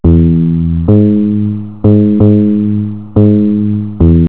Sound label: tune